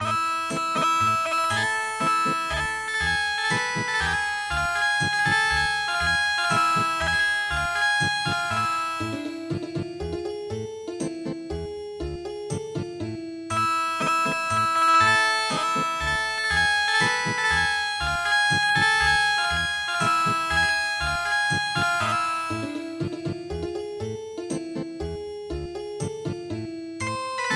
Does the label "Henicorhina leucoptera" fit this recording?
No